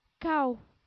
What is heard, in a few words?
cow